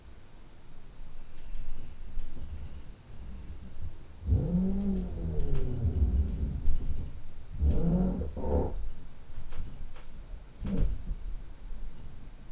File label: Drill